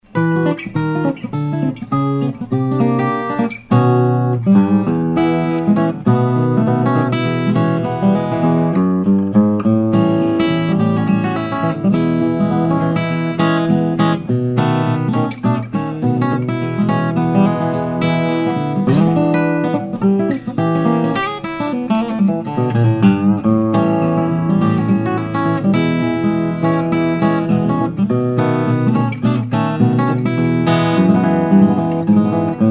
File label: bozung auditoriom, steel-string flattop guitar; cb guitars om